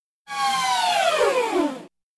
Crying Motor Down, servos

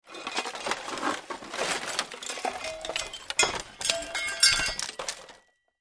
Wood Search Through Garbage or Junk Pile; debris

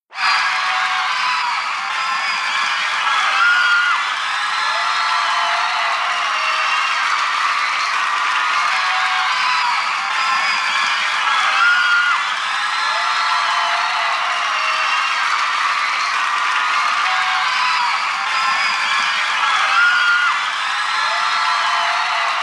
Group Kids Cheering

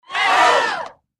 Gasp Sml Crowd Surpri

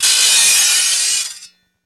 Metal Grind Wheel, grinders

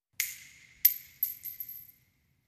Shell Case Sngle Revb, shell casing movement

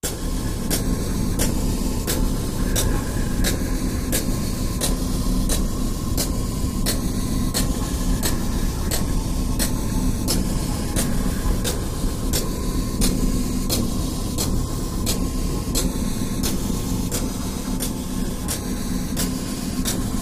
Breath Counter Machin, machines winches

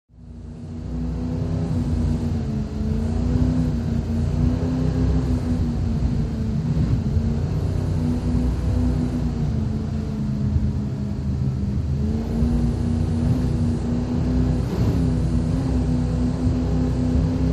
Power Boat Int Racin, off shore power boat

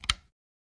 Light Switch Lamp; switches

